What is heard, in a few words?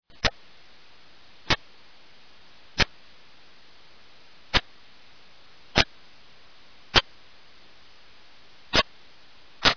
turkey cluck